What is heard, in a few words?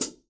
REAL DRUMS FUNK KIT; Best Service ProSamples vol.43